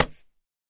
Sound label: Boxing Glove Hit Slap